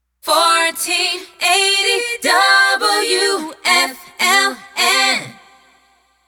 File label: Jingle Acapella; WFLN 1480